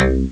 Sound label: Funk Bass, Amiga Soundtracker; ST-XX Amiga Soundtracker Sample Packs